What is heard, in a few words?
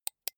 computer mouse right click soft